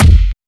kick drum f sharp key

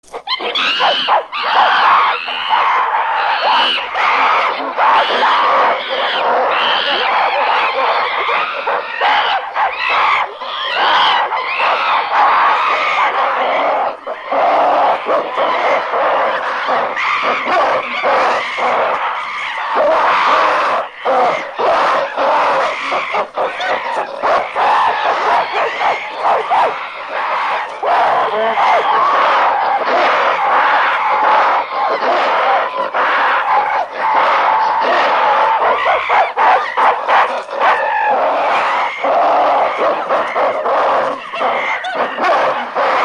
APES LONG ANGRY SCREAMING AND GROWLING; animals

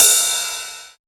ride cymbal drum sample a key; cymbal drum samples

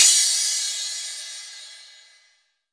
huge crash cymbal sound sample a key; cymbal drum samples

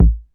tight bass drum sound a key; kick drum samples

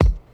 club kick a sharp key; kick drum samples

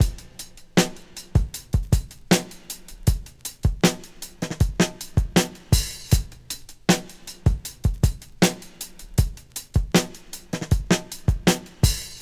79 bpm breakbeat f sharp key

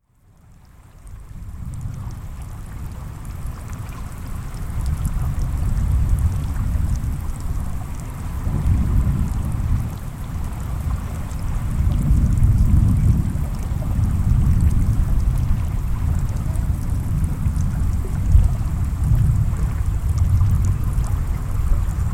rolling thunderstorm and light rain near sea

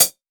good hat sound f sharp key, hi hat; hat samples

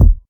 low tone kickdrum d sharp key

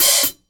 juicy open hat b key

